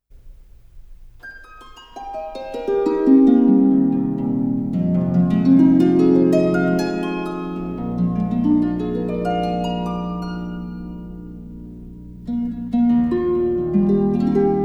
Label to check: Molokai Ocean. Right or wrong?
Wrong